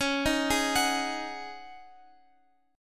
C sharp Suspended 2nd Suspended 4th, C sharp, Guitar, Open F; arpeggiated; x,x,13,10,11,13